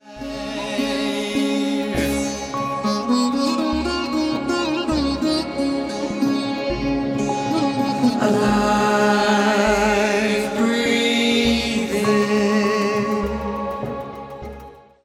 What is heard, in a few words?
Breathing